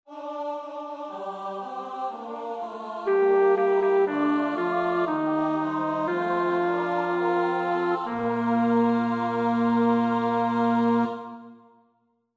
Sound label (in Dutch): bas 1; Schütz (Heinrich); Matthäus Passion SWV 479